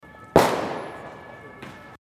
Silvester Knaller

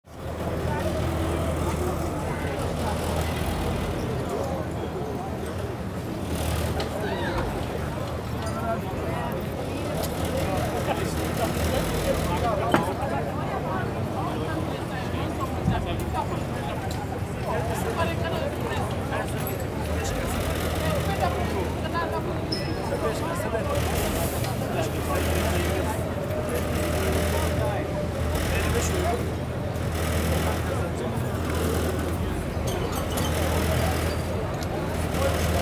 Wasserwerfer

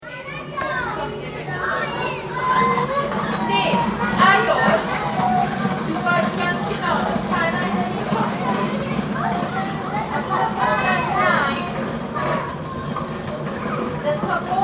spielende Kinder

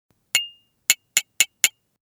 Loeffel an Tasse

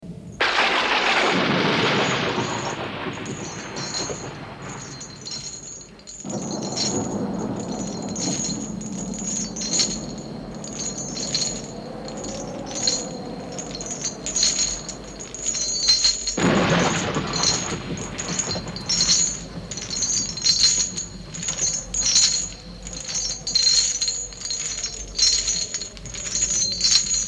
Horrorgeraeusche Kettenrasseln

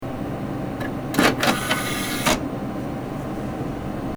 PC Laufwerk raus

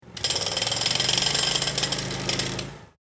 Presslufthammer

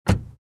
Auto Tuer

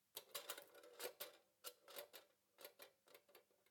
Personenwaage

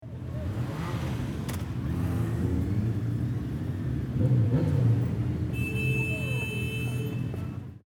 Silvester Hupen

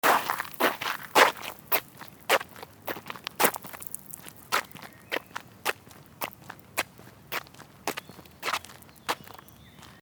Spielplatz Schritte

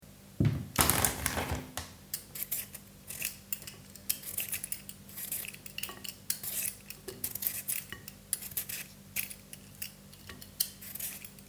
Kartoffel schaelen

